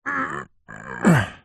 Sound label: human male voice grunt groan lift heavy weight; zapsplat